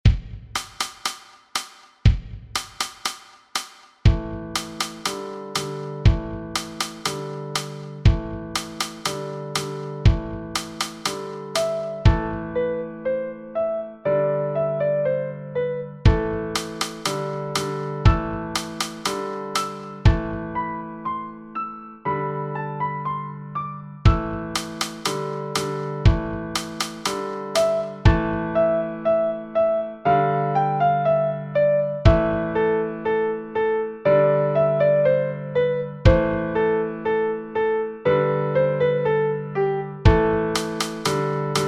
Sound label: bamboo panda with percussion